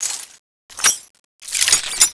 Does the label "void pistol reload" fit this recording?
Yes